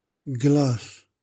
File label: glass